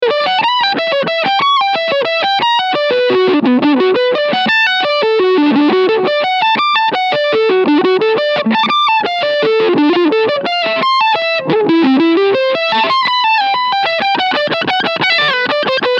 JHS PG 14 mid freq full mid boost off drive push fulltone